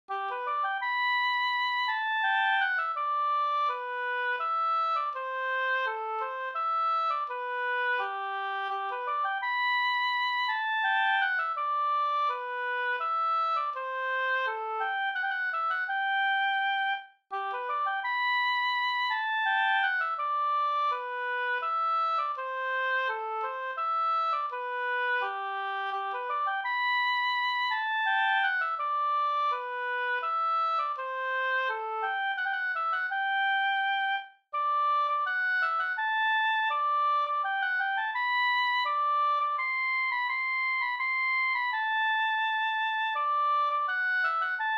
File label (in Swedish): skanklat